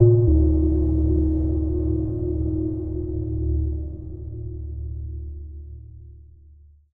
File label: editor blips; Source Engine; Portal 2